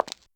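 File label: Footstep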